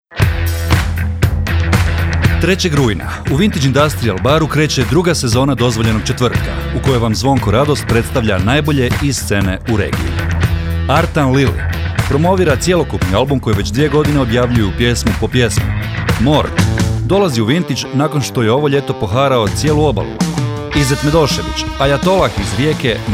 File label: jingle